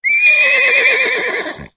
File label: Horse Whinny Sounds Car Horn